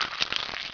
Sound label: bite; vampire demo